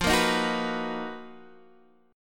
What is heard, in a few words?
B Major 7th F, chord; Bmaj7/F strummed; JGuitar